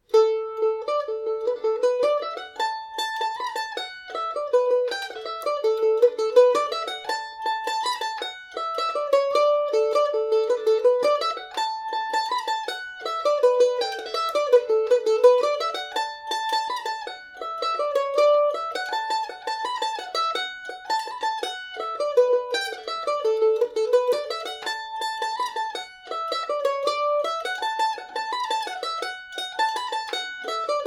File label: jig, irish mandolin; dan collins fathers